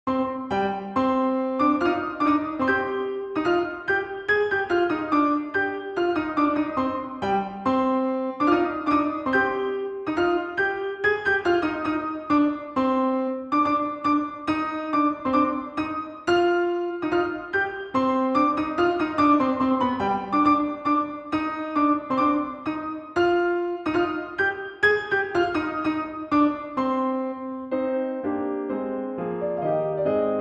L' Arlésienne, Arr. Solo Piano, Suite; Grainger

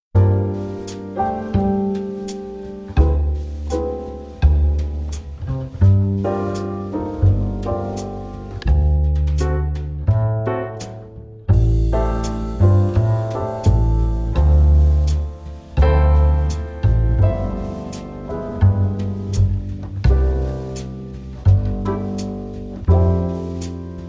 Jazz

